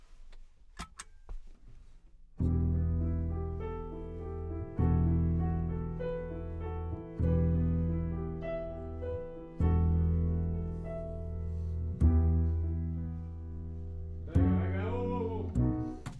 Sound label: C Bass